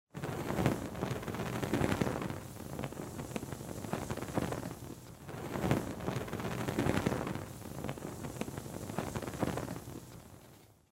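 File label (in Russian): горение маленького факела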